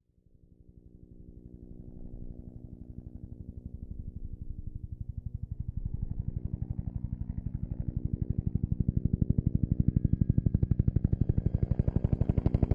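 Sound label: Medium Speed Helicopter, huey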